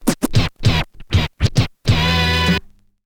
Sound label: scratch kit, vinyl